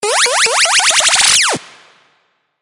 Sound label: Sms Jingle